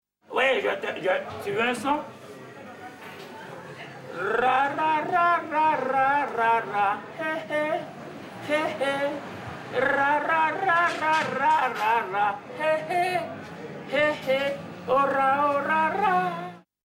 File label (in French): Jingle; La Maison Garage